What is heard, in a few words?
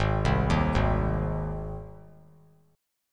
G sharp Major G sharp, chord; arpeggiated; JGuitar